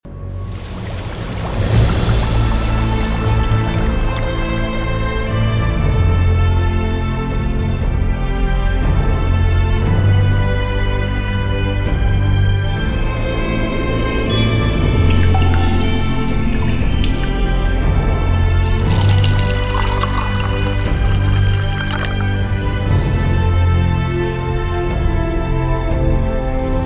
大气的背景音乐

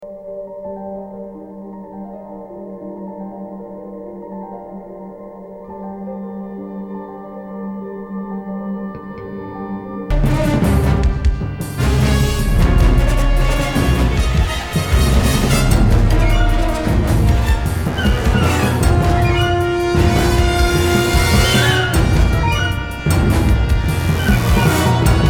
电影音乐; 奥斯卡电影音乐

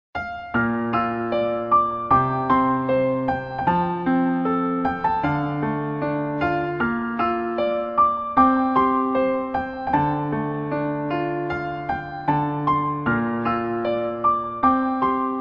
彩铃背景音乐; 30秒以内

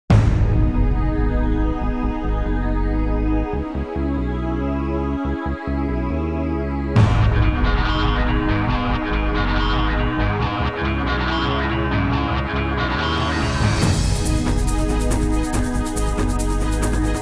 纪录片背景音乐

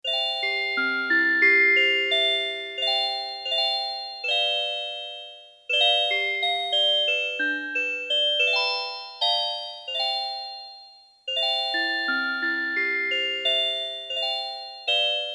片头音乐

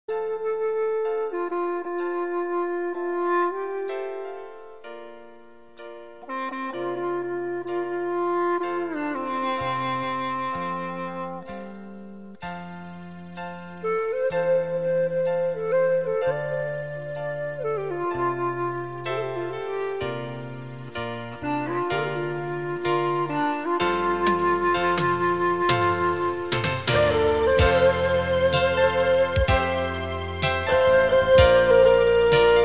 广告片音乐

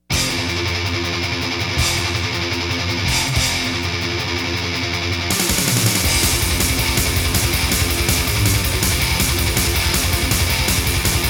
激情的背景音乐

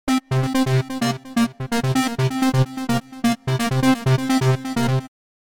片头音乐

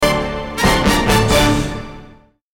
电视包装音乐

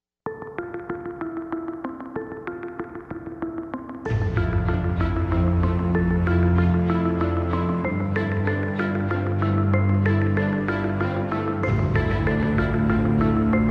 豪迈的背景音乐

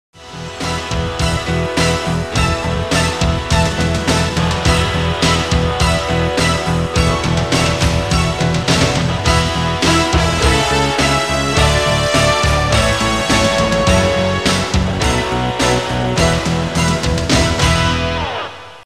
片头音乐

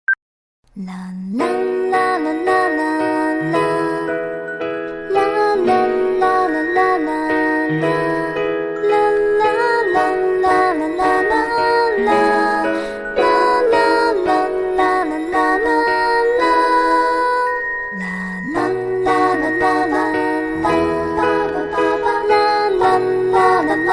微电影背景音乐

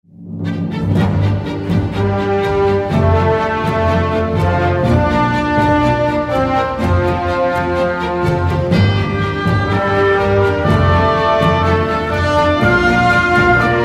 专题片背景音乐

